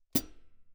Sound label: hihat, foot close, ff; Percussion; cymbals